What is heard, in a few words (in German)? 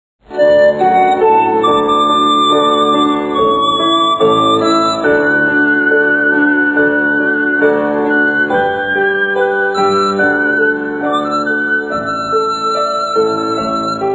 panfloete